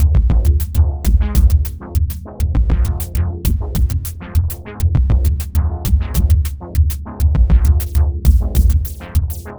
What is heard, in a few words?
Shuffle Shift Beat; Sonic Foundry (Sony Creative Software); Ma Ja Le Ethereal Textures; Beats